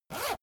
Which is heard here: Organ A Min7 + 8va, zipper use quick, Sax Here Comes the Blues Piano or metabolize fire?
zipper use quick